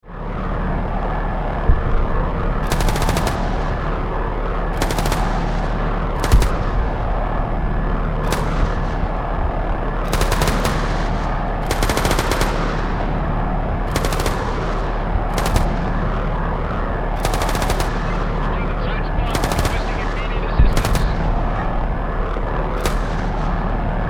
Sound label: Helicopter machine gun fire